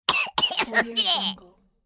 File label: cartoon cough